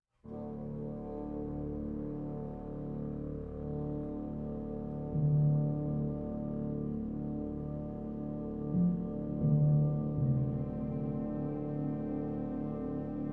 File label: Timpani, Moderato E Rubato; Concerto For Timpani